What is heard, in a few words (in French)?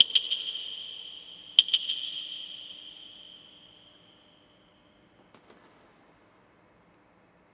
LA BOULE echo de 150ms 3 rebonds prise et coups au centre parterre de la sphere